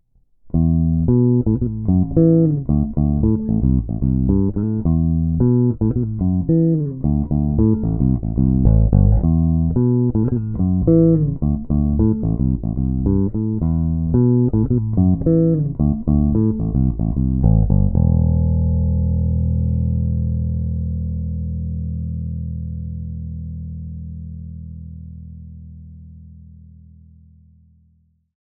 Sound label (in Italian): Entrambi dita chiuso; JBA54 VS 60s